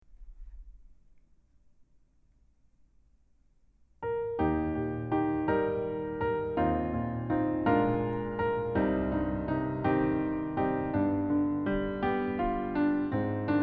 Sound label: voice and piano